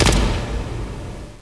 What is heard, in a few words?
ak47 Single Shot